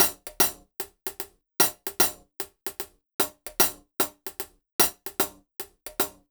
HH, Ragga & Dub Reggae; USB Soundscan vol.27; AKAI

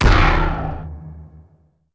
HIT, PERC; Crypton - DrumBase5000; PERC.COMBI

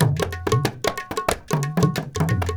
India, Heart of Asia

